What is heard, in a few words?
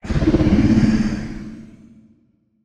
attack; Barotrauma; Legacyendworm